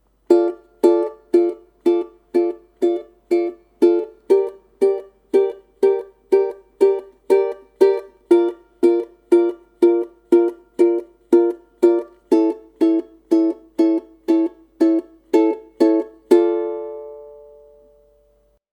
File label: Piano